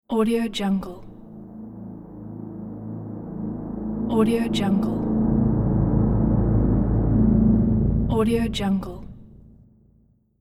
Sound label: braam trailer